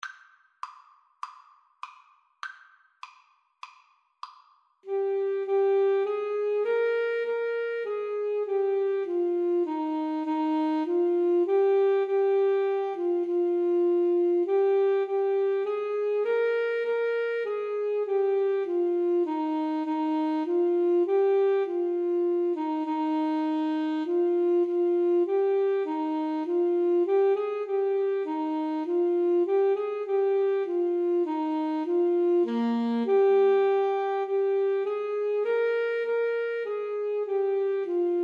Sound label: Alto Saxophone Duet